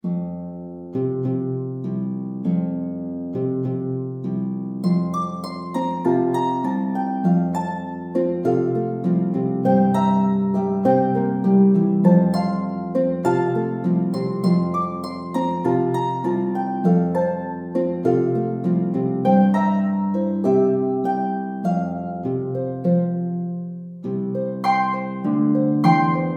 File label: Jamaican Rumba, harp